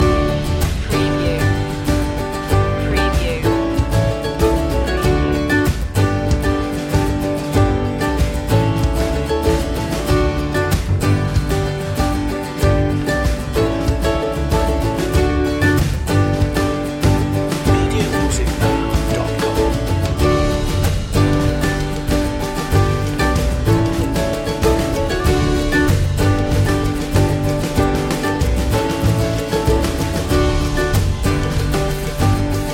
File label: chant no vocal